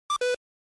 Arcade Shooter Score

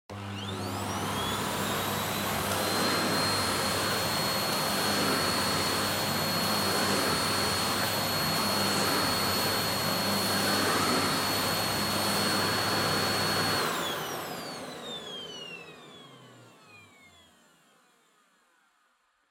Vacuum Cleaner Vacuum Floor Soft High

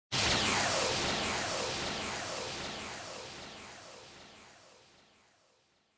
Motion Speed Lines Long; モーション スピード線

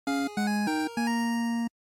NES RPG Level Up

